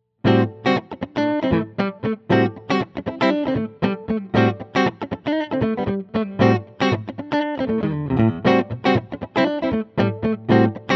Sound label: clean; G10CS